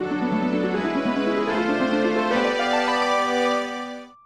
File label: Fanfare; Nintendo; The Legend of Zelda Majora's Mask